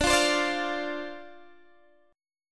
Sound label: D-Minor 7th D Guitar DADGAD, chord